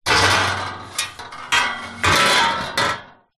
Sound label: metal frame bed loose hit rattle; impacts